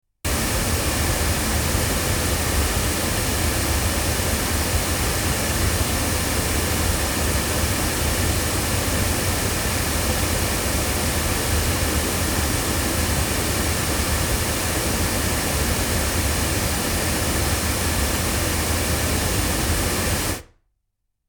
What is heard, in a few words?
loudspeakers; LineAudio SM3i; microphones